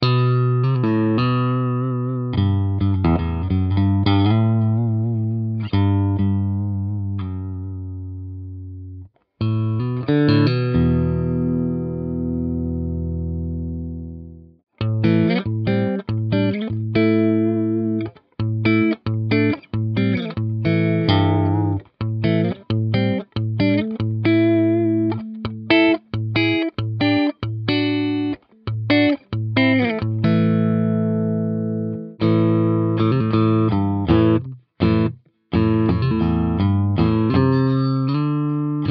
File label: middle clean, pickups